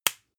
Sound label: spice jar small close hard; industrie wirtschaft; gastronomie